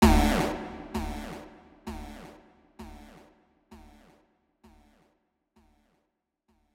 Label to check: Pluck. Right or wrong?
Right